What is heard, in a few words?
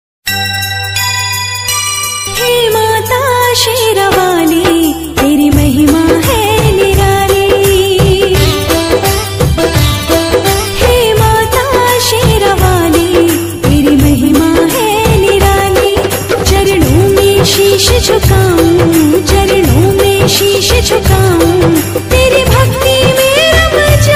navratri bhajan ringtone